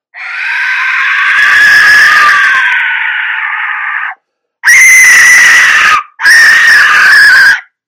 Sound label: large whistle